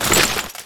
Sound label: metal